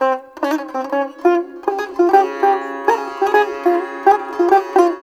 SITAR; Spectrasonics; Heart of Asia

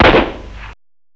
RIFLE; MACHINES; M8; CMI Factory

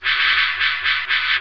SWISH MACH; ENVIRN-AMB

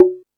Jungel Percussion, E-MU Pro-Cussion; drum machine

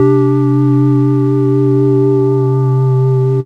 STAB Sine Interval; Polyend; Plughugger

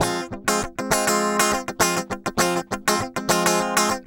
GUI 100 BPM

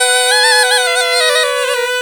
PUNGI; USB Soundscan vol.45 - Indian Traditions; AKAI

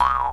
JEW'S HARP

